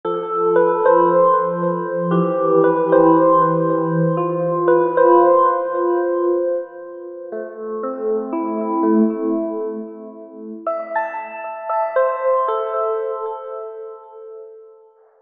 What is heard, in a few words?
SYNTH TEXTURES - Bells; Rotuma Bells; Apple GarageBand 2